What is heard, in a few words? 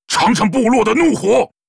warcry; Nazgrel; zh